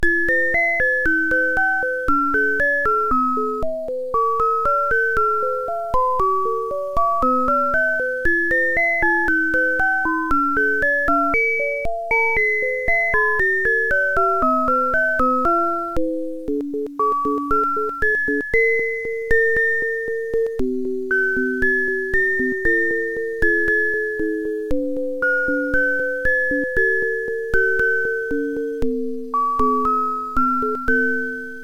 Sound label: sine st; mymelo